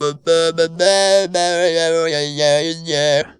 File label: Funky Vocals; USB Soundscan vol.18; AKAI